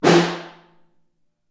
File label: SN2 Far, Snare; Ableton Foundation; Snare Designer